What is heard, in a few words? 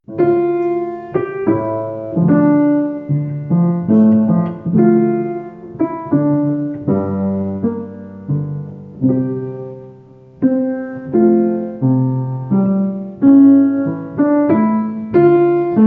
Waltz accompaniment